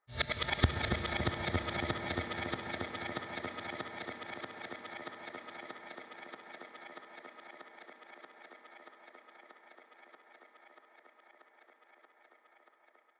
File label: Voodoo 142 BPM, Ethno, Psytrance; Ethno Box; Voodoo Gris Gris